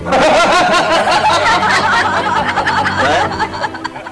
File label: hahahaha